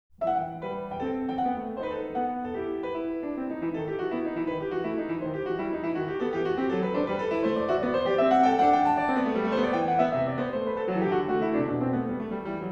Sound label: Bach Tempo di Gavotte